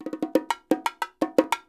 percussion loops 120; Sampleheads; NYC Percussionworks; 90 s Sample CDs

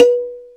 PINGBELL, Ragga & Dub Reggae, BELLS KIT; AKAI; USB Soundscan vol.27

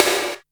Boss DR-660 Snare, Drum Machines

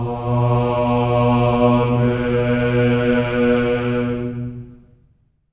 amen; Spectrasonics; Symphony of Voices; GR AMENS

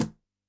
Yamaha DD-65, CAGO, PERC

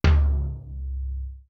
KETTLE2DRM; East West; Global Sounds

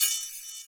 metal; ILIO - Ethno Techno

